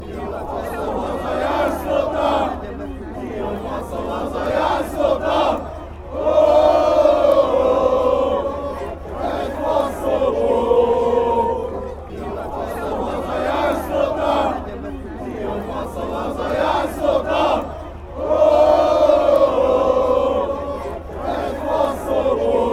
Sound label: hungary chant